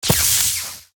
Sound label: zap